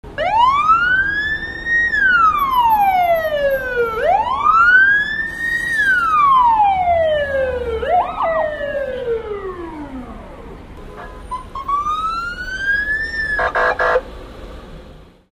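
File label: fire engine siren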